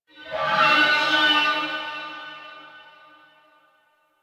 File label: Ship Energy Flow Texture Med, Ambience; Warframe; Corpus Ship Remaster